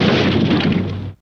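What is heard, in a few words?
Plaster Wood Falling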